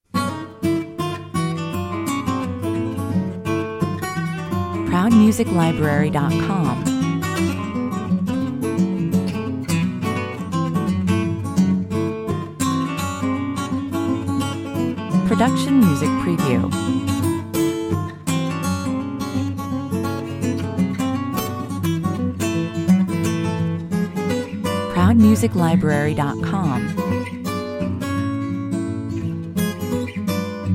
A happy and playful ragtime performed with a folky sound on three acoustic guitars, 85 bpm; A fun, wholesome, feelgood American country rag.